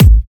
puffy top end kick drum sound a key; kick drum samples

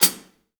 bright pedal hi hat single hit c key; hat samples

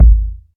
reverb bass drum g sharp key

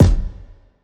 long tail reverb kick drum one shot f sharp key

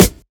present acoustic snare f sharp key

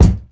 rap kick drum single shot b key

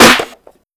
reverb snare single hit A key; snare-drum-samples

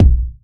subby bass drum single shot b key; kick drum samples

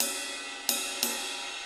crash cymbal sound c sharp key

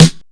sharp snare sample e key

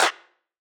clap sound f sharp key; percussion; single hits one shots

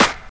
Classic Hip-Hop Snare Sound A#; snare drum samples

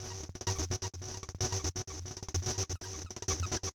crunchy monotron f sharp; instruments; loops; crunchy monotron 2

